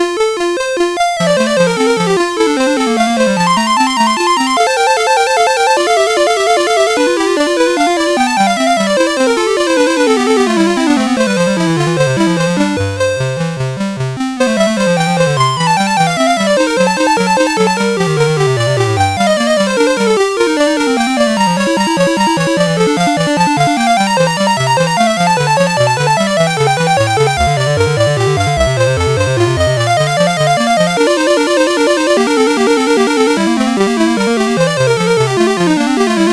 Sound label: Two Part Invention No8 in F, CSG8580R5